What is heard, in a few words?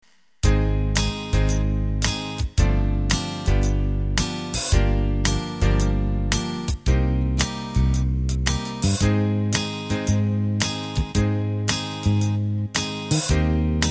70s radio pop